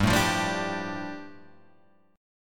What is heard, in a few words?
G 7th Suspended 2nd; strummed; JGuitar